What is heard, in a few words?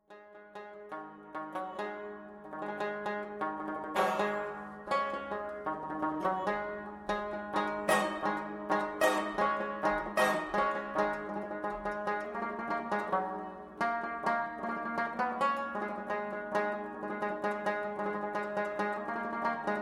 lute